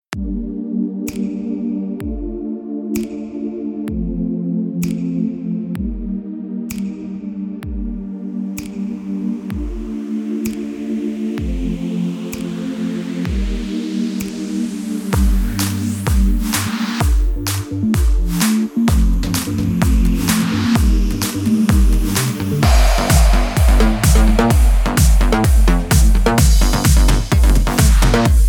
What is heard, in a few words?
Battle Alarm